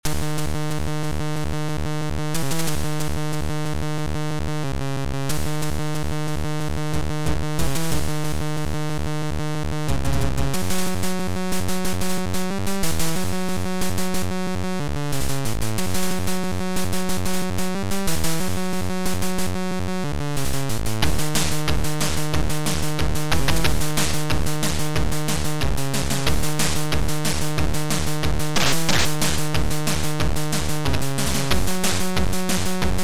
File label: Trance; Mast M